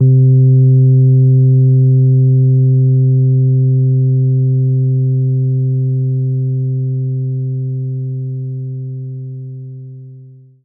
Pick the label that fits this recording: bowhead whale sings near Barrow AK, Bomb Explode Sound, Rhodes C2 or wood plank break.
Rhodes C2